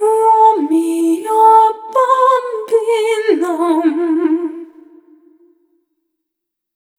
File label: Frailty, vocal samples; Sonic Foundry (Sony Creative Software) - Pocket Diva World Class Vocal Samples; Op-Erratic Loops